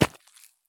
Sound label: sprint gravel; Escape from Tarkov